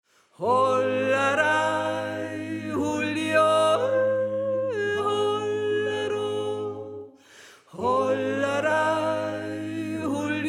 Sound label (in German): Sopran; Bauern-Ari